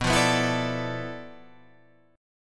D Minor 6th Add 9th B

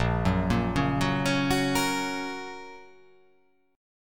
A sharp Minor Sharp 5th, down

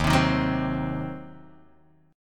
Eb Minor 6th, Guitar Open D

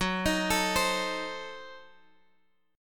F sharp Diminished 7th F sharp Guitar DADGAD

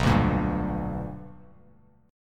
B Minor Major 7th Sharp 5th Bass Standard; strummed; JGuitar

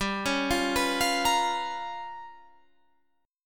G 7th Sharp 9th Flat 5th, Guitar, Standard; G7#9b5 arpeggiated; JGuitar

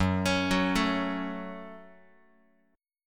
Gb Minor Gb Guitar; arpeggiated; JGuitar

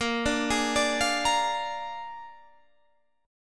A sharp 7th A sharp

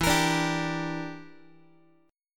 E Minor 7th Flat 5th, Guitar; x,12,13,12,11,x; 4A,4E,5A,5D,5G#,6C#; strummed; JGuitar

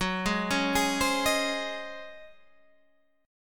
Db Major 7th Suspended 2nd Suspended 4th Gb

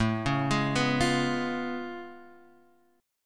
A Augmented Major 9th A down Guitar DADGAD

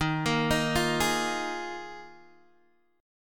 Eb Suspended 2nd Suspended 4th, Guitar, Open G

